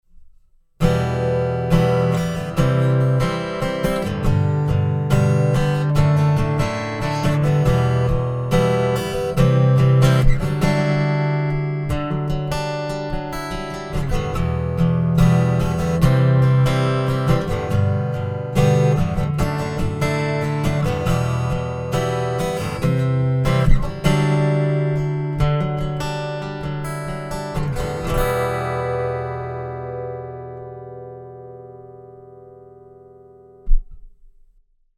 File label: Fishman Aura Spectrum Dreadnought Strumming Ibanez AW54 Blend Comp